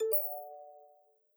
Charger Plugged In; Sony Xperia XA2

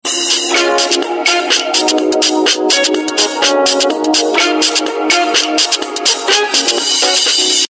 Ringtone, Samsung GT-B3313 Corby Mate; India

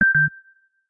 Beep Beep, ringtones; Samsung Galaxy S23

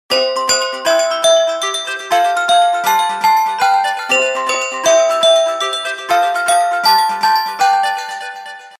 Leave School, alarms; Meizu MX2

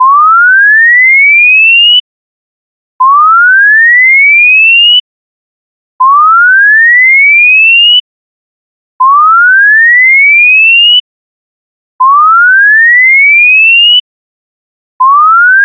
siren 1khz 3khz alert full scale; Samsung Galaxy S10

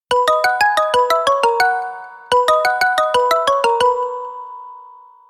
Xylophone, ringtones; China Telecom

